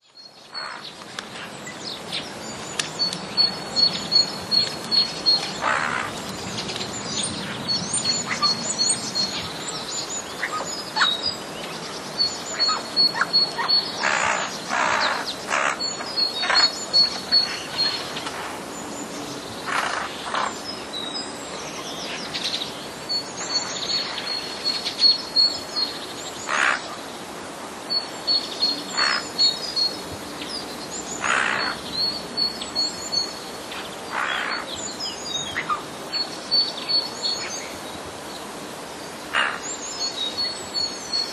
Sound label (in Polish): sojki; niskie krakanie, popiskiwanie i inne odgłosy